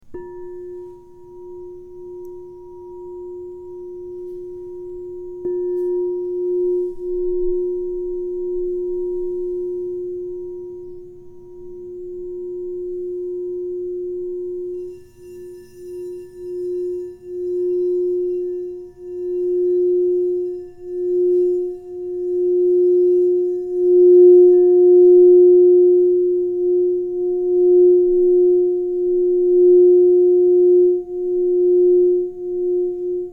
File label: Crystal Tones Singing Bowls